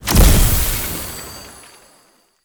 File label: Magik Explo Rune Death Explosion; Powers; Runes